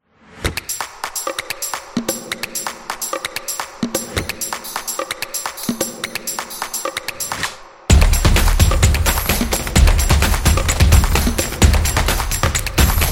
Fun Music

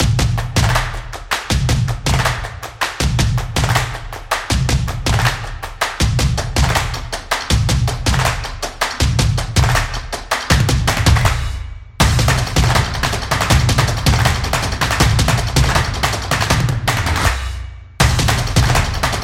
Percussion Intro Drums

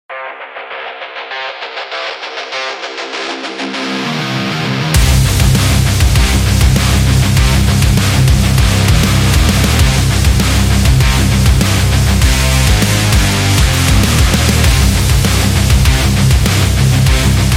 Metal

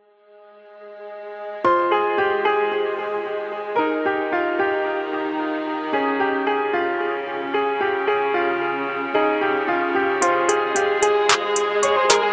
Hip Hop Piano

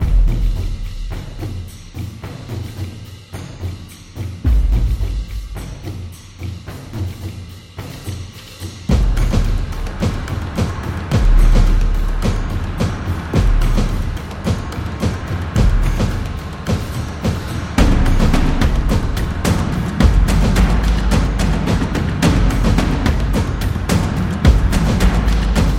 The Epic Drums; monster attack

